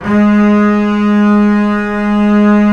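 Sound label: bass G#3; Digital Sound Factory Virtuoso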